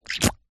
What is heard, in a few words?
cartoon squelch suck